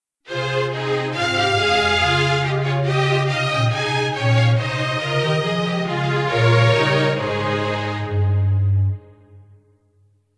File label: Instrumental; Bach-St-Matthew-Passion-3